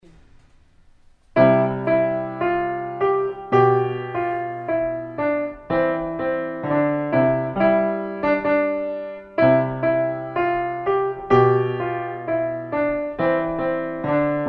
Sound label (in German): nur Klavier; Beethoven; Ode An Die Freude